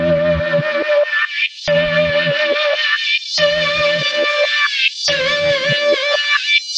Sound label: filtered chaos guitar, drum loops and breaks; 141 to 160 bpm